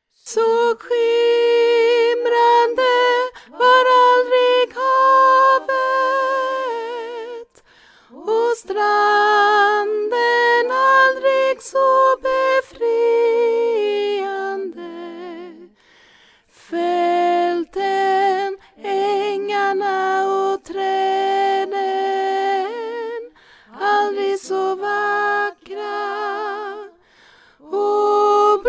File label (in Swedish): tenor